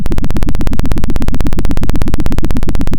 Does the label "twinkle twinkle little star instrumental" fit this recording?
No